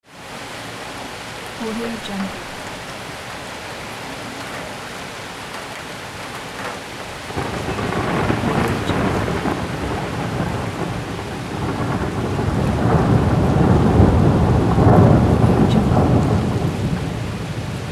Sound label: light rain with thunder